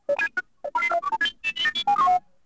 Beeps, droids robots

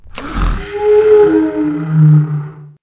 Call, Dino; Para

